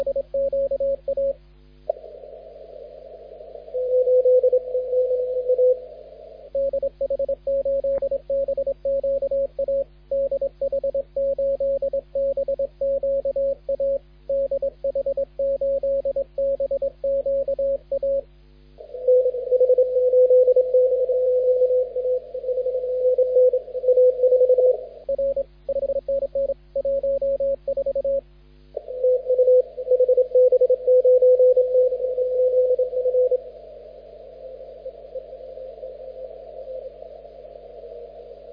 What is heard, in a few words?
CW 21MHz; HB9CZF; CQWWCW